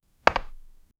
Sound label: short soft but clear, chess